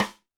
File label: Snare, Roland TD-20